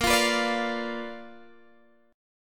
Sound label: B Minor Major 7th Flat 5th, chord